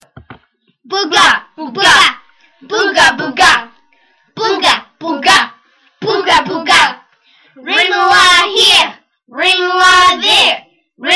rimu chant

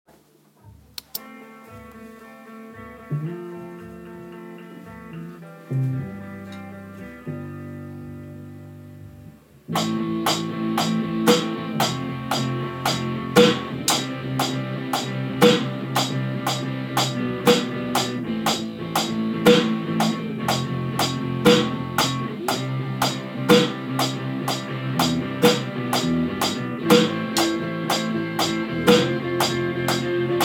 4/4 drum recording

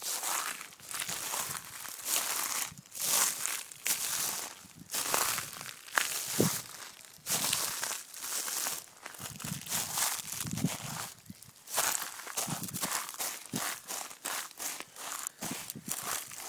walking on dry seaweed

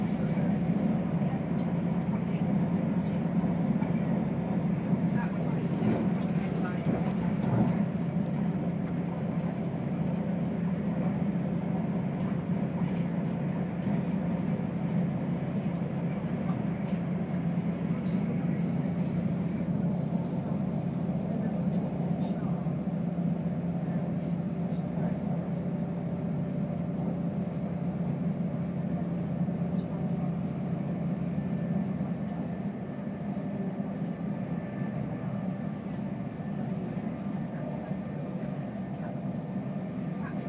ambient train commute

